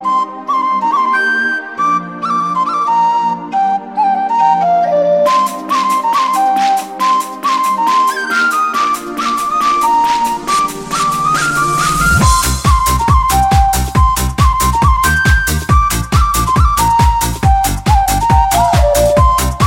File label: flute and bass